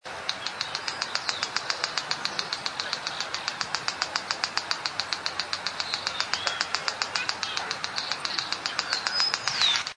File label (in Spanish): voz junquero